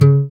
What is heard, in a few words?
GUI AC, Acoustic Slap, Roland; Sound & Vision; Gigapack I CD 2